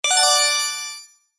break and chain

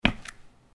Footsteps Concrete Asphalt Street

